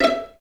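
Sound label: violin pizzicato E4; Best Service; Peter Siedlaczek's Advanced Orchestra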